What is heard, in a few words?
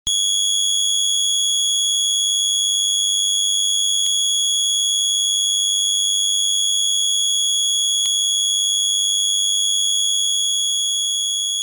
Tentacle Mating Call; Maniac Mansion